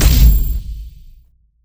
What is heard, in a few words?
impulse